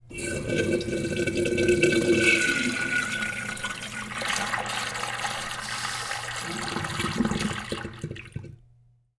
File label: Urinal Flushing; urinarios